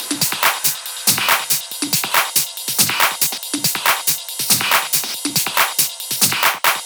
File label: drum Loop 140BPM Full